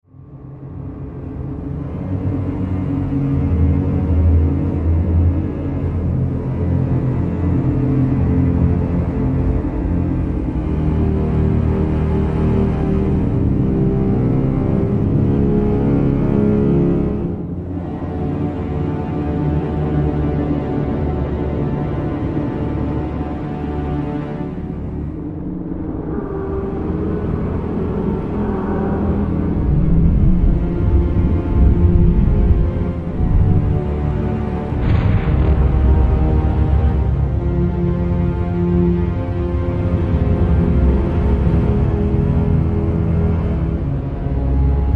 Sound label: horrible underwater; aquanox2